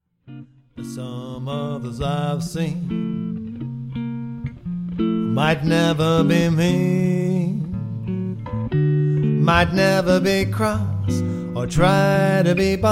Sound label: guitar duo